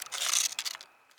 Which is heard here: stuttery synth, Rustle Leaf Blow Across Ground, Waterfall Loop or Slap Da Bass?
Rustle Leaf Blow Across Ground